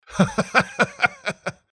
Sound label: Cackle; sound design; Father Gascoigne